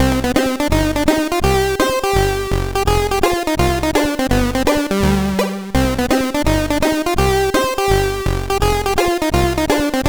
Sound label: sid CSG8580